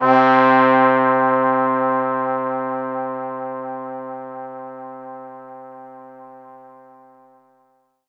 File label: C4, Synth Line; 90 s Sample CDs; NiceBeats; SynthLine VOL-24